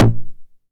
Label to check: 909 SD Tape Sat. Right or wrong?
Right